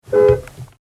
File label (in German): Auto Hupe